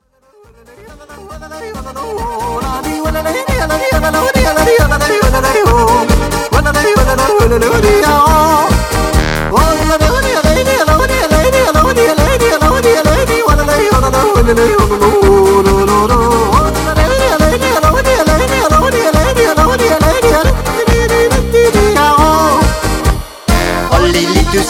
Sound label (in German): Solo Jodler